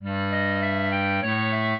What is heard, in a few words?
clarinet; Mozart